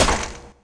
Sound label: stab flesh